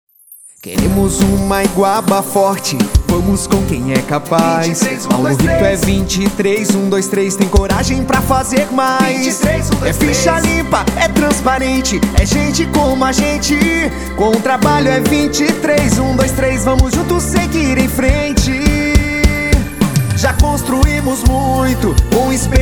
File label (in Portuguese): Jingle VEREADOR